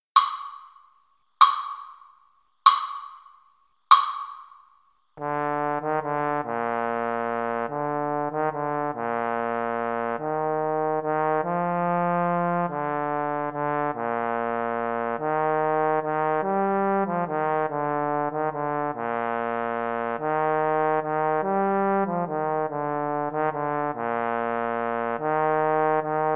Trumpet Trombone Duet

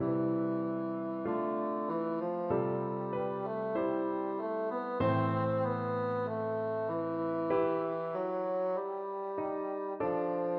trysting place, bassoon

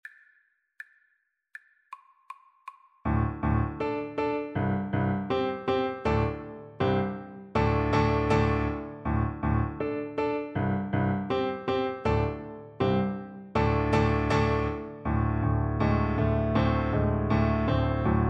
thumping blues, cello

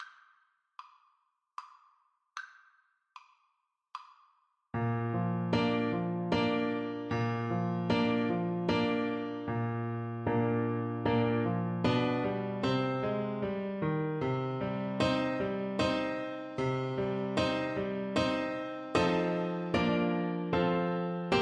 polonaise, Flute; chopin

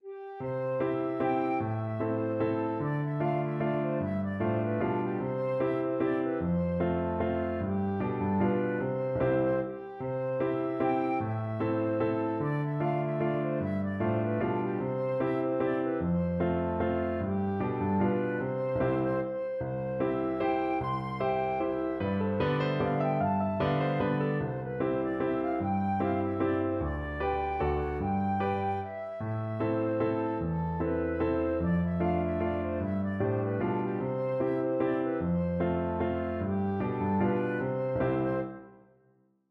beauty in tears flute

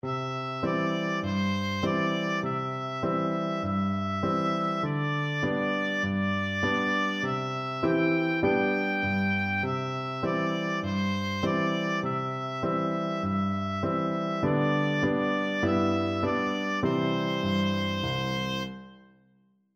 mary had a little lamb, oboe

